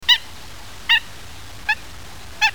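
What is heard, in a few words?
seitakasigi, black-winged stilt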